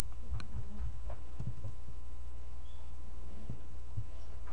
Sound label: bottle